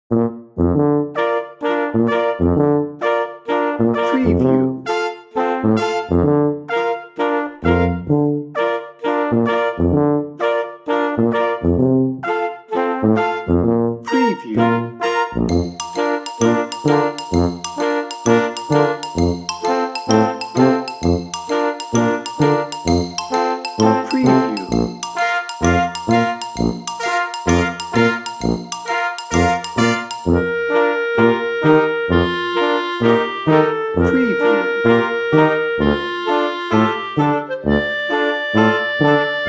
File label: march no drums